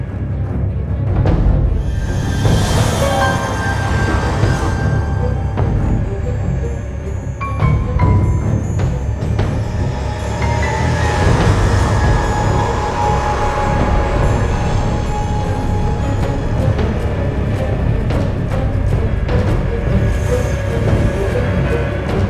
Survivor Attack Loop; Dead Rising 2 Off the Record

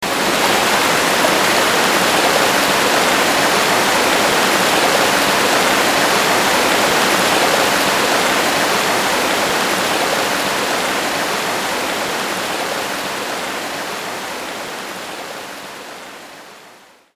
amb shower; Wario

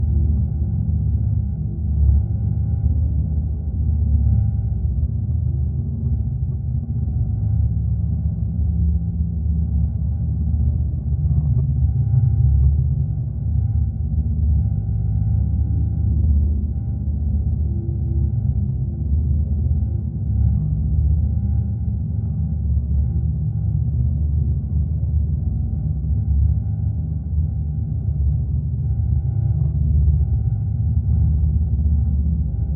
Space Ambience

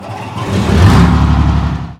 Tank Engine Start

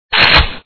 reload; Dad 'n Me

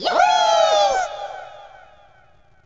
yay; stardunk ios gamerip

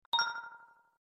Game Complete Add; Blockman Go; Android

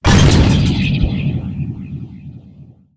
Pickup Explode

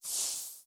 soul pickup; Hollow Knight

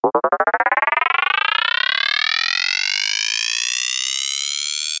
fill effect; Mobigame; Zombie Tsunami; android

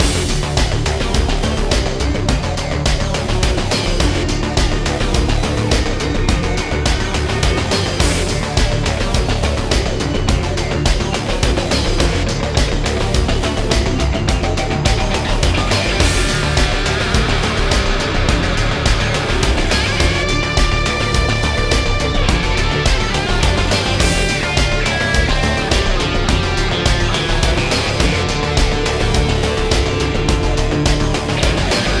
BGM Kowloon Park

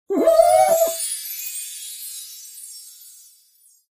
Pepperbomb Gum Clear; candy crush saga gamerip

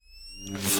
Tesla Reload; Gameplay Wpn

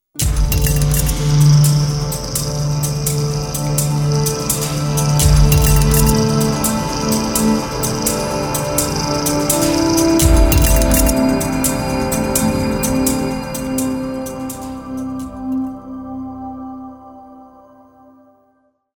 promotion; planetside

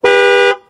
Car Honk

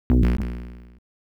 Die; Sonic WindowsZone

